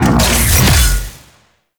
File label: Mech Stab Ability Throw; Nechro